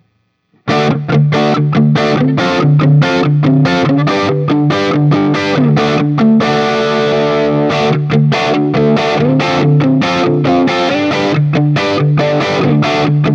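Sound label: Guild Starfire I SC JCM800 HB D, guitar; Guild Newark St Starfire SC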